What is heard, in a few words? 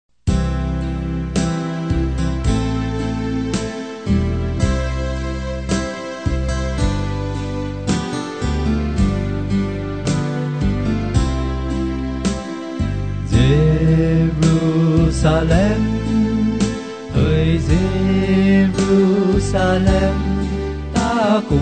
bass